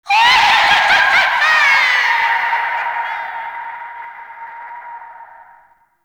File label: Paint Pickup Laugh; Gameplay; Warframe; Shadowgrapher